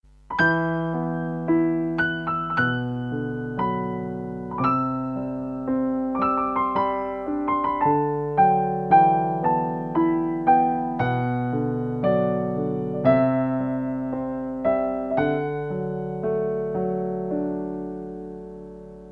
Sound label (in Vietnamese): Nhac Chuong Piano